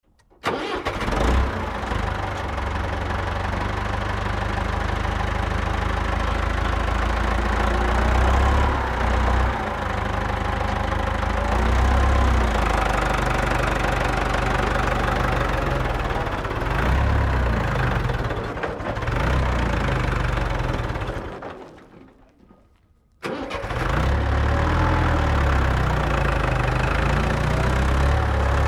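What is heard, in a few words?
Tractor Farm Start 3 Point with Stall Away; 3 Point Turn With Stall; Tractor Farm Start 3Pt W-Stall Away; Tractors; Vehicles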